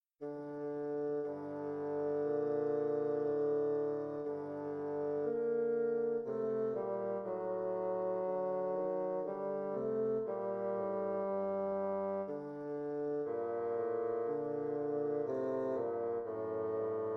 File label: bsn duet; ernest krahmer